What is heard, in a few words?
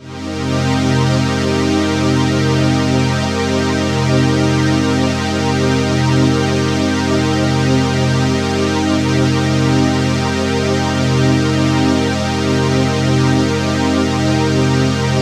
Pad C2, club techno